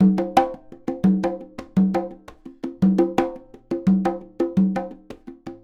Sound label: 170 Dance Congas; Percussion; CongaBongo; Pro Tools Essential Loops; Digidesign; Dance DJ